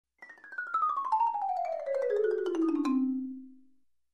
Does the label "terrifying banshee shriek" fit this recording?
No